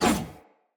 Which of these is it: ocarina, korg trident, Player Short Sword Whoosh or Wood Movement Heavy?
Player Short Sword Whoosh